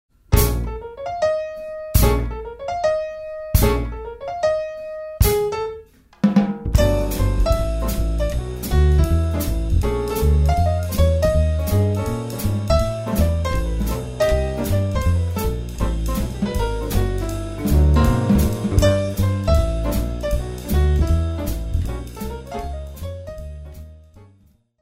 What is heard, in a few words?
Jazz Dance